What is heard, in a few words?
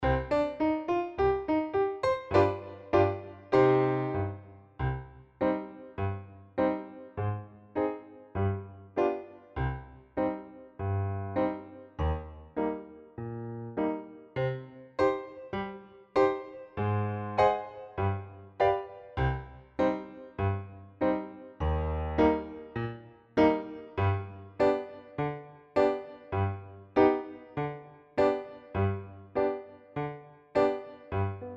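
hall of the mountain king accompaniment 100bpm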